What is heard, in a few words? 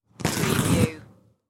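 Toilet Paper Rip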